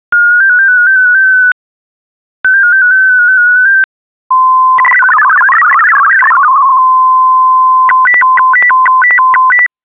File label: MFSK64 RGB test pattern